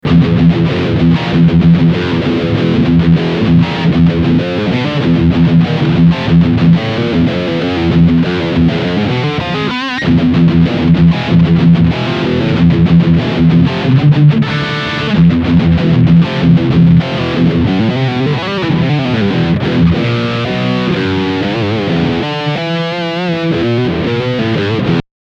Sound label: Channel B High Gain Scooped